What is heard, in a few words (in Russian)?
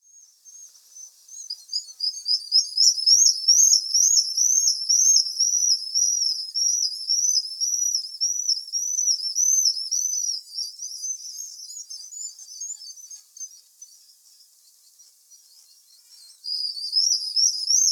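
chistik golos, black guillemot voice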